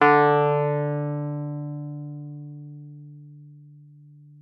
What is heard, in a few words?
WURLITZER D3; Xsample VOL-1; WURLITZER RS